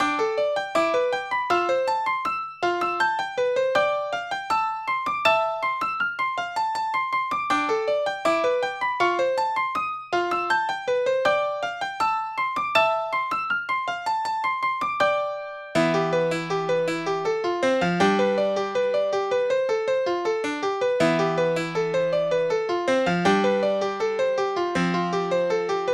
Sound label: piano